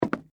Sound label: Footsteps Wood Step Single; Human